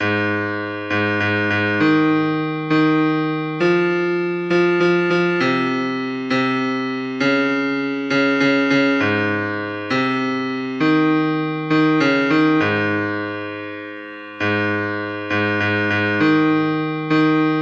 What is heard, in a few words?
Bass